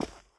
carpet step; Golden Crayon Sounds

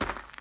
gravel step; Golden Crayon Sounds

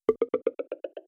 Folder Opened; ESAN VO Sound Schemes; ESAN Business SSound Pack

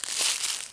leaves step; Golden Crayon Sounds

